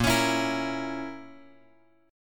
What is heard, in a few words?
A sharp Diminished, chord; A#dim Chord {6 X X 6 5 6} strummed